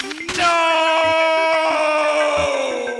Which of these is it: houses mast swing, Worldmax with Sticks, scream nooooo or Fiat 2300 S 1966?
scream nooooo